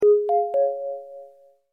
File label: Message Sms; Trillian